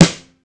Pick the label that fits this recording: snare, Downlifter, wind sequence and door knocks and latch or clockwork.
snare